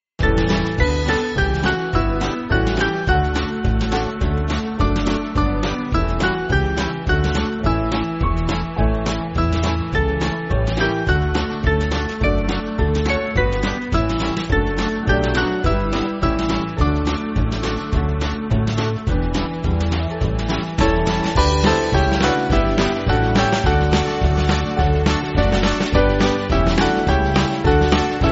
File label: How Beauteous Are, Band